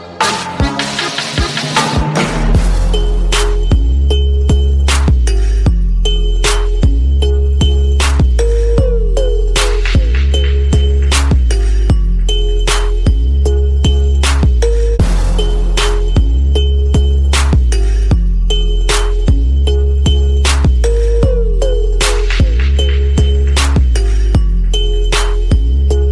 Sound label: Carousel Beat Drop